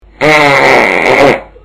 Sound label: fart